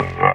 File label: DR. LOOP, DRUMS; X-Static Goldmine 3